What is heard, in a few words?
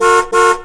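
auto medium car horn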